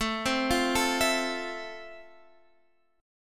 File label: F Major 7th A